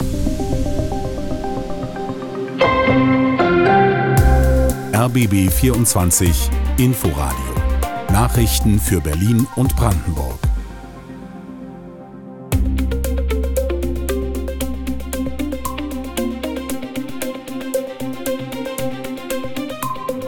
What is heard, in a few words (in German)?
Nachrichten Lang; swr3